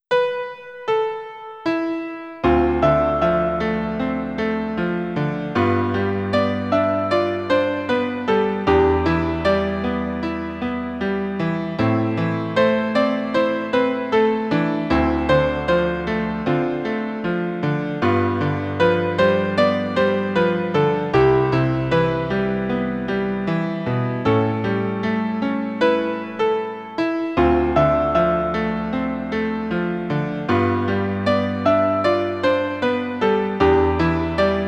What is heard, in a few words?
Piano Solo; Chocobo no Fushigina Dungeon 2 original soundtrack